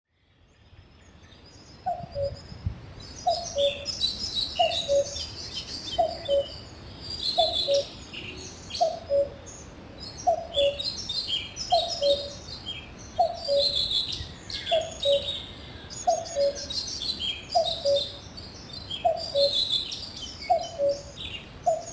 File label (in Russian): kukushka; shum prirody